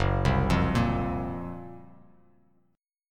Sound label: F sharp Major 7th Flat 5th F sharp, Bass Standard; 2,3,3,3; arpeggiated